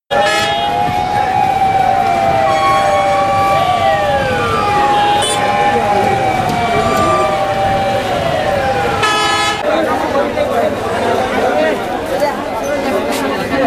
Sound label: Bangladeshi Traffic